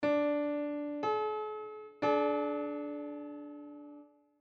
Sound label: 654.545c, 11edo